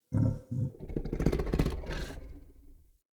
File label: Battle Dog Snore; Animals; Vocals; Snc Battle Dog